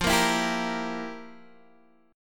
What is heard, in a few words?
F Major 7th Suspended 2nd Suspended 4th, Guitar, DADGAD; down; chord shape